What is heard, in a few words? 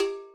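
percussion Agogo Low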